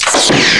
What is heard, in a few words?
SHOT; Empire; sonix; chipmusic